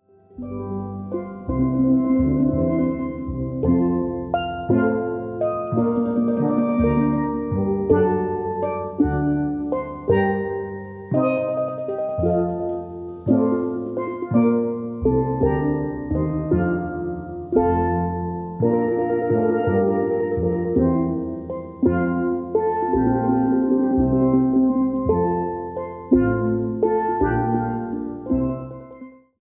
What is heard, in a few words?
Air From Water Music, steel